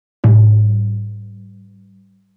floor tom mallets

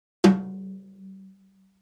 snare drum no snares brushes

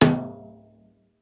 tom press

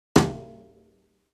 tom press brushes

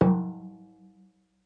tom mallets

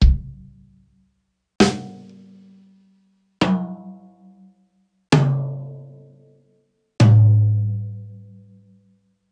drum set sticks

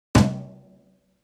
floor tom press brushes

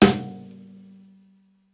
snare drum snares

